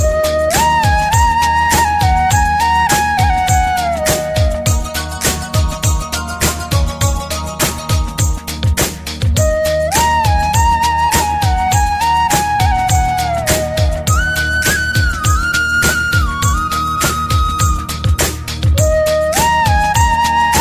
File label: Classical instrumental Ringtone; MUSICA CLASICA; tono de llamada